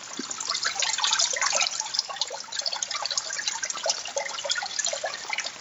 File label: suiro; 水音４; 水路・湧き水 排水溝 じょぼじょぼ ちょろちょろ